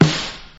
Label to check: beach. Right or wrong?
Wrong